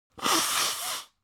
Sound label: human nose blow